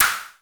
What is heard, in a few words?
TECHNO PERCS; Crypton - DrumBase5000